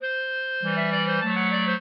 clarinet; Mozart

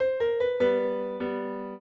piano; Mozart

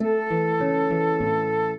minuet, flute harp; Mozart

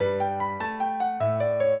minuet, piano; Mozart

